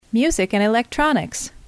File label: music